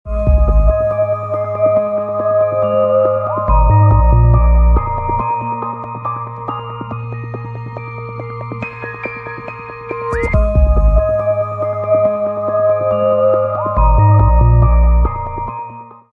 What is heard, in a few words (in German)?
Musik Klingelton Real Music; O2